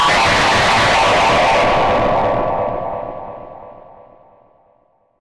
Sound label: ominous hell super music